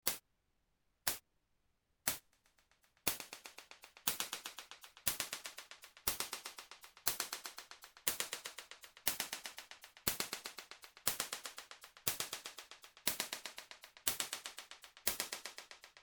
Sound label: echoes; native instruments absynth 4